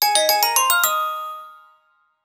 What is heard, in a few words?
Wedge Alert; Sony Ericsson; PlusFourSix; Venus (Xperia X1); Lars Edvall; Clouds